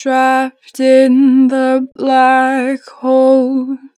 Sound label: Natural Vibrato, Vocals, 2 bar vib; Black Hole; Harmor Vocals